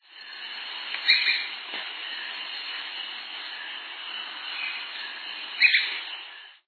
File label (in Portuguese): patinho escuro